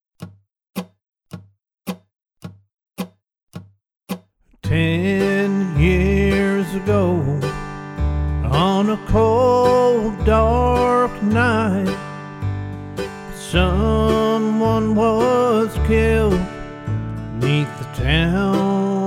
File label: Key of G vocal and backing track